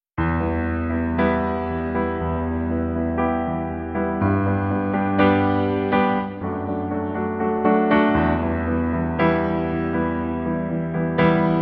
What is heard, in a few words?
PIANO FORTE